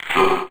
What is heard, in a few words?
VFX, VOX ROBOT; Best Service ProSamples vol.49 - FX-Area; AKAI